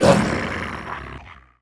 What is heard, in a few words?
death